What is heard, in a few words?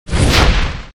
menu hit; geometryrash